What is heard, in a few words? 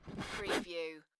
Shower Gel Bottle Squeeze; beanstalk audio